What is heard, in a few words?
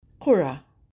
kora; NOONGAR